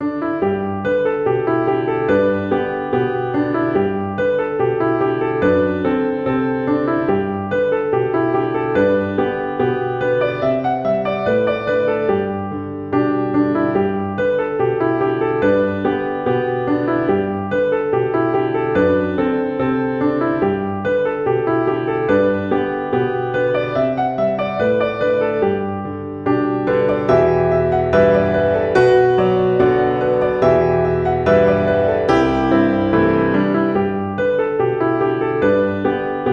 Mandolin piano; Sinclairs

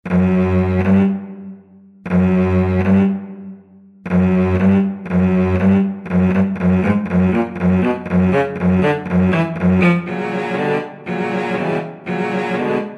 cello quartet

